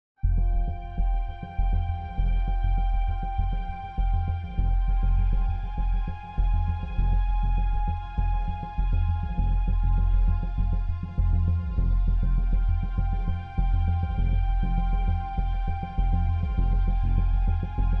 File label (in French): sillage de combat, macabre